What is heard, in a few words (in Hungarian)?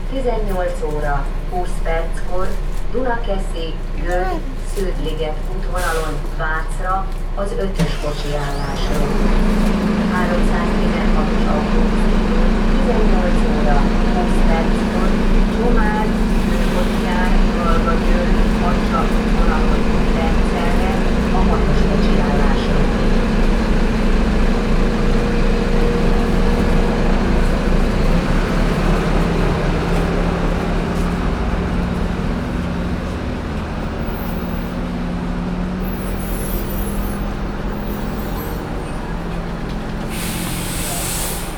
újpest városkapu helyközi, busz távolsági; busz tavolsagi2014